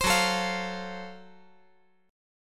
F sharp Minor Major 7th Flat 5th F sharp down

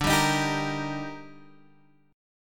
D sharp Major D, chord; strummed; JGuitar

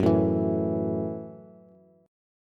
F sharp Minor 7th F sharp, Bass

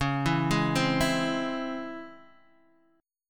C sharp Minor Major 7th C sharp Guitar Open D

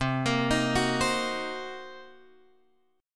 C Minor 7th Flat 5th Guitar Open F, chord; Cm7b5; arpeggiated

